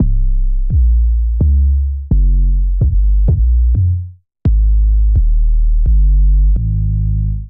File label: Kit Melodic 128 bpm, Drums; MIDI Clips; Boom Butter; Ableton; Chop and Swing